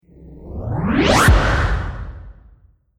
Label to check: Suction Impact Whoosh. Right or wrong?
Right